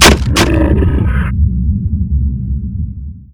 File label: dargon crack; Politburo